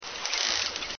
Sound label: buzz, southern resident killer whales; salish sea data examples; signals